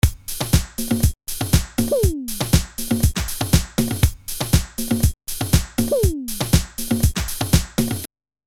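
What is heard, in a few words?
Kontakt Maschine Drums Solid Buss Comp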